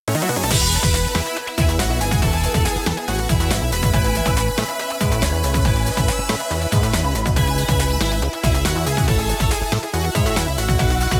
Ending; Yu-Gi-Oh 5D's Wheelie Breakers; Nintendo Wii

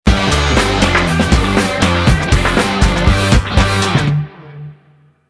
Music Win

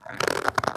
Hub-Train Strap Squeak

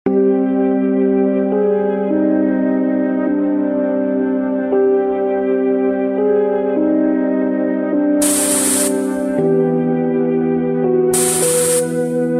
Glitch Horror; menherafflesia flowering abyss; android

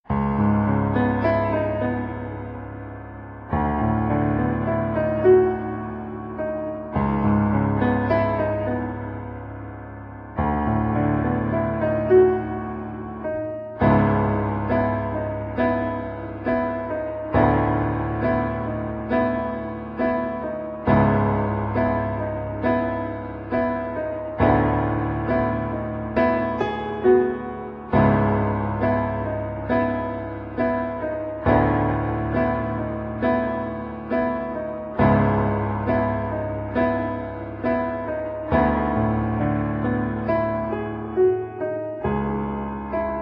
Dark Piano Music; menherafflesia flowering abyss; android

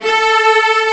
Strings A4; Goal FH; 3DO gamerip 1995

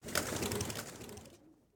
Doves

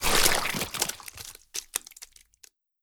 Corpses gore

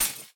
Cut Metal

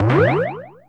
Balloon

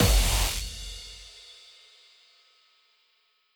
Loose Champ

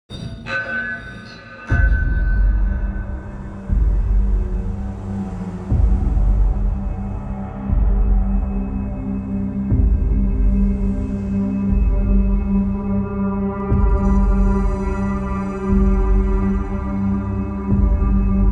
Battle

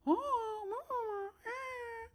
Grub Wave; Hollow Knight

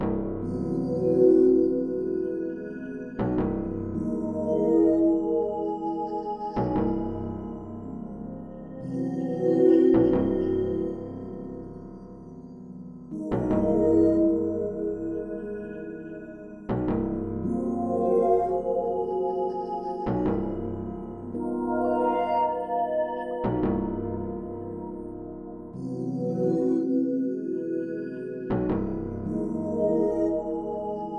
music (Lab Exploration)

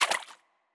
Spiderweb Throw

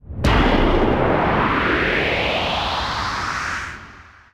Relic Suit Blasts Off

